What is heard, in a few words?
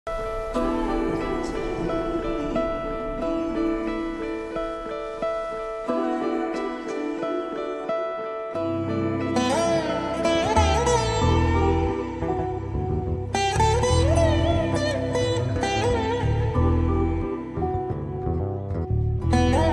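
entry background music; ananya; telugu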